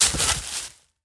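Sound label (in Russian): поиск потерявшейся вещи среди сухих листьев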